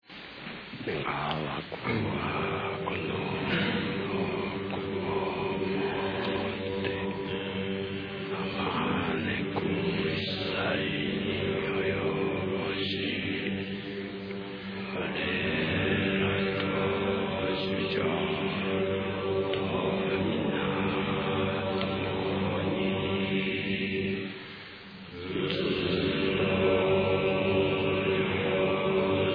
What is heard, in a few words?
end chant